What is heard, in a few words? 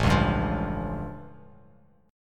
A Augmented 7th A Bass Standard; strummed; JGuitar